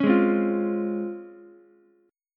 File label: C sharp Major 7th C sharp Ukulele; C#M7 strummed; JGuitar